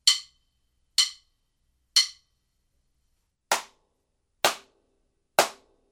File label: studio ceiling after